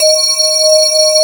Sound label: METAL BAZZ